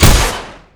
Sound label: punish rocket; garrysmod